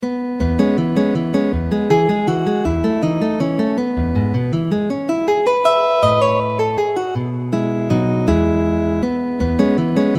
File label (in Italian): valses, guitar